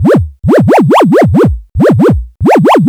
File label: ARP2600; AMG - Vince Clarke Lucky Bastard